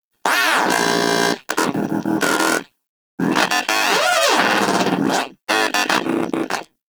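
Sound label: Moa Aggressive Vocal Interact Stand Side; Warframe; Pets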